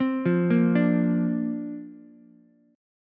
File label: C 7th Suspended 2nd Suspended 4th, down, Ukulele; C7sus2sus4 arpeggiated